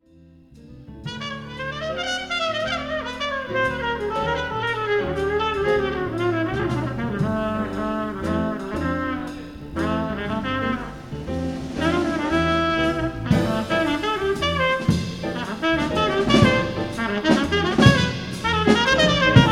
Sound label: trumpet